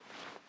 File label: Sfx Baseball